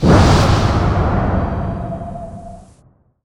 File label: windwalk; weapons; dota; clinkz